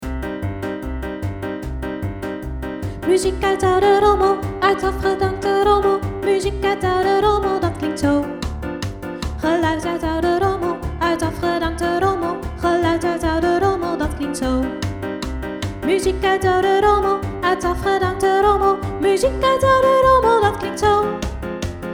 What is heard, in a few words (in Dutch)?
muziek uit oude rommel met zang; Je hebt helemaal geen instrumenten nodig om muziek te maken!